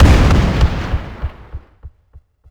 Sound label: mega kick